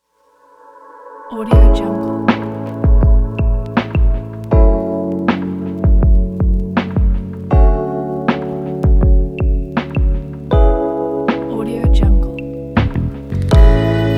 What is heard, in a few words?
romantic